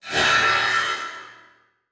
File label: Transition Metallic Blast; Production Element